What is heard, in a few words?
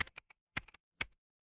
office keyboard